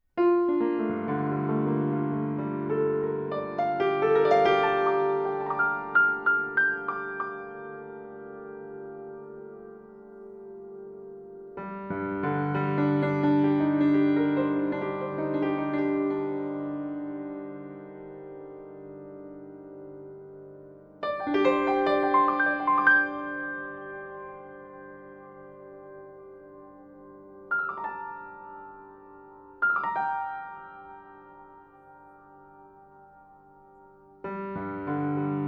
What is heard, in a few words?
peace mind